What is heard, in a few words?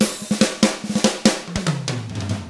rock 6/8, drums; ILIO; Double Platinum Drums 1